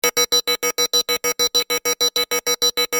Futuristic alarm imminent threat or warning loopable